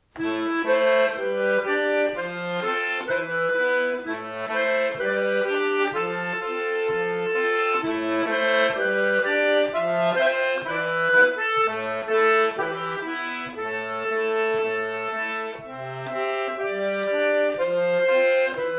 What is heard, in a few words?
scotbass